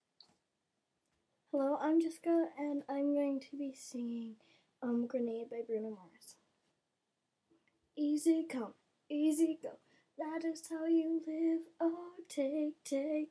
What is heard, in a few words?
me singing